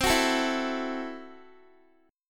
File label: C 6th Flat 5th, C, down, Guitar; C6b5 strummed